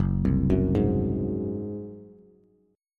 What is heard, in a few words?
Gb Suspended 2nd Flat 5th Bass; Gbsus2b5 arpeggiated; 2,3,4,1